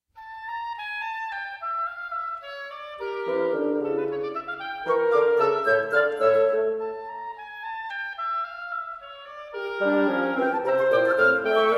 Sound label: Quintet; in D Minor; Mvmt III